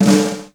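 snare roll rock down, Yamaha Motif ES drum machine; 300 Drum Machines; Yamaha Motif ES-Series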